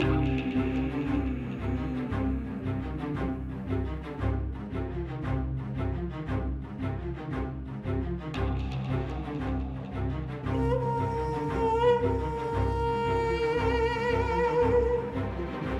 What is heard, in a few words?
Tension Loop 57.5bpm 4bar; project zomboid